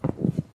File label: Player Walk footsteps mat Walk; SFX